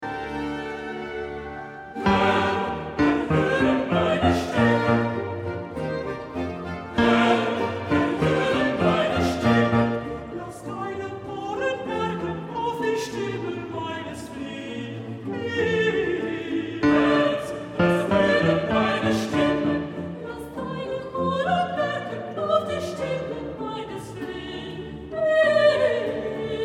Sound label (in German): Bach Aus der Tiefen, Bass